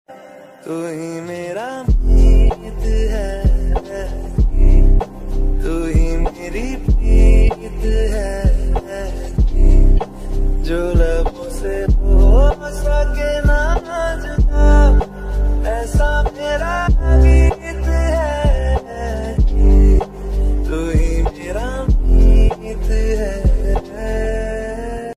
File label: romantic love ringtone Hindi love ringtone new song ringtone